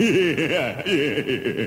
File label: laugh goofy demon; ooh ahh